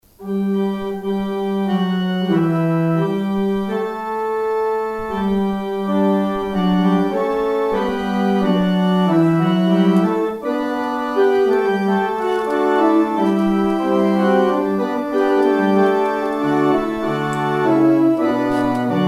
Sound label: organ outro; Organ exit music; harvest